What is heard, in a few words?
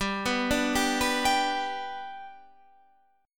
G Major, Guitar, Half Step Down